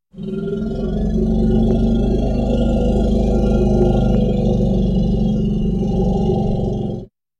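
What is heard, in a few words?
slon golos, elephant voice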